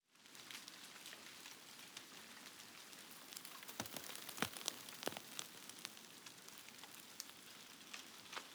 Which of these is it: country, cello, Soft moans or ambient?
ambient